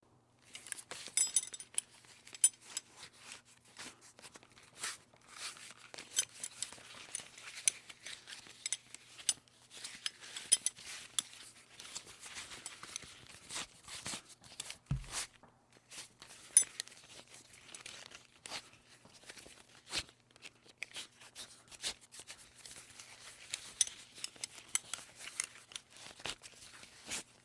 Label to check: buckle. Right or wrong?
Right